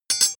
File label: sword blade rattle short